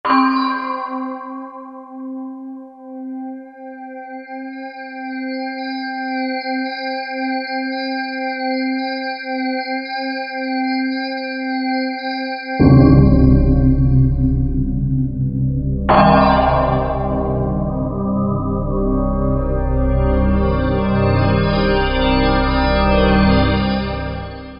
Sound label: Korg M1; Factory preset examples